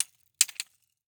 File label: Rollerskates, old fashioned, all metal, wooden wheels, clash, strike, clatter, ping, double, loose; Eiravaein Works; Vaeyan IV